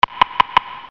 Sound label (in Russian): sms Notify, Сигналы SMS